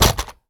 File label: weapon rifle impact; physics; weapons